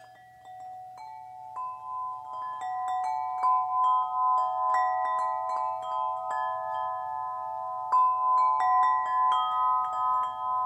Baby Ben 39in music, metal wind chimes; Ultimate series

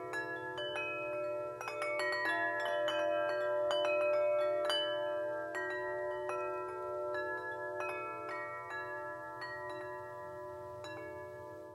44in, metal wind chimes; Evergreen series; Forest Edge

